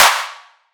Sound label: drum clap only serum; Virtual Riot; Drum Hits